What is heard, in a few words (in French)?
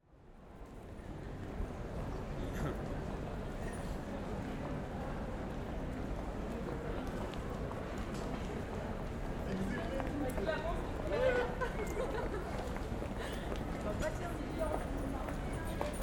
Souterrains Halles Parcours Porte Lescot porte du jour